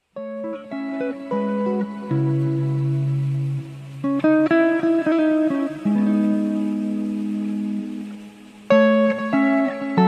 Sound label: Ukrainian folk music; Ремікси